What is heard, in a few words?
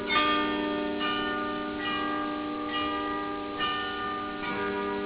carillons, bell; gouda